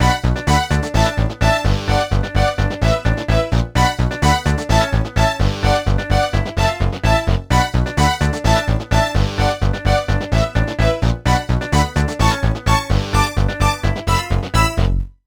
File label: Rock And Roll